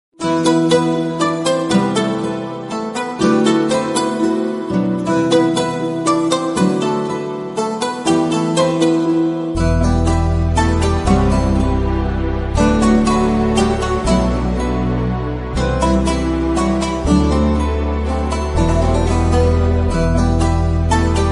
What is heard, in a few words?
Love Music Bgm Ringtone; Parizaad